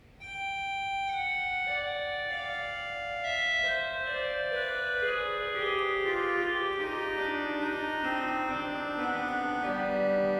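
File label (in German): krummhorn 8, Chororgel; kirchenmusik; pfeifen; gereon koeln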